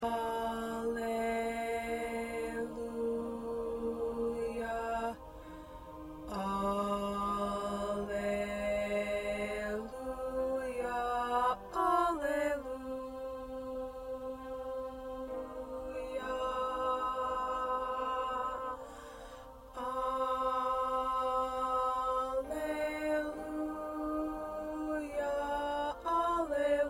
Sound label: 2nd Tenor